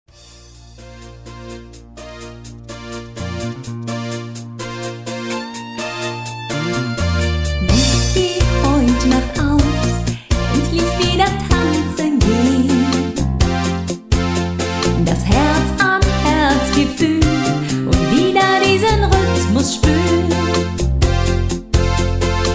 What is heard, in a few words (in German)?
schlager